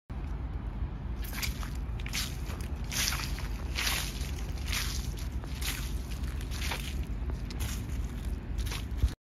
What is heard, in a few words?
Walking on dry leaves